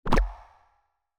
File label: Bubble; Eden